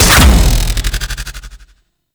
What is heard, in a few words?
Short Bow Charged Fire; Warframe; Duviri; Dax; Weapons